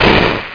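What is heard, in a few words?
big explosion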